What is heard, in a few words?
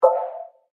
Chat Out; Sonim XP8 ATT